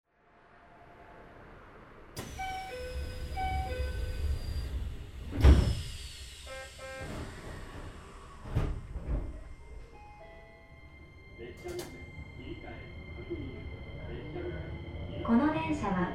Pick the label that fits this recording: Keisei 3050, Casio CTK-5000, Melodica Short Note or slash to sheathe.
Keisei 3050